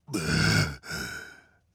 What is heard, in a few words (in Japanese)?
あぁ…